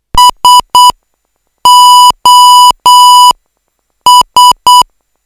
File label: tone